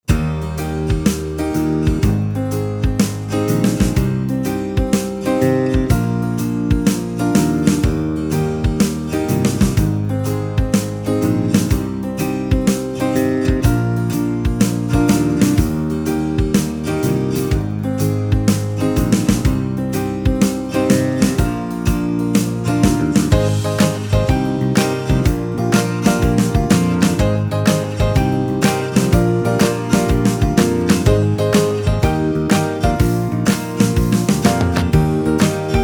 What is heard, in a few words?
backing track